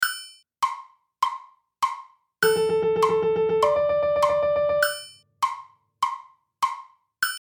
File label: group notes sound nonuplet